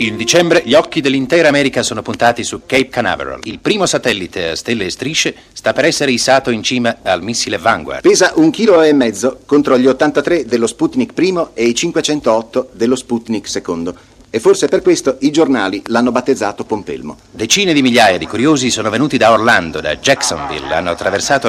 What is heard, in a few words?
countdown and launch of vanguard 1